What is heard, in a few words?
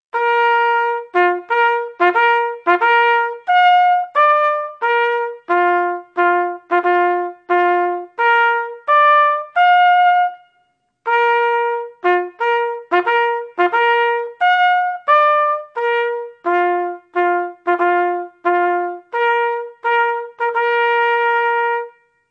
To Extinguish Lights, bugle